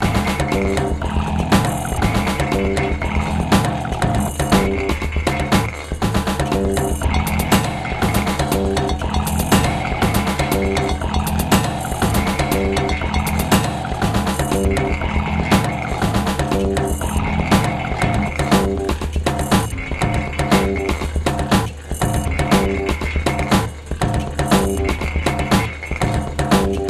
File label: beat; teleport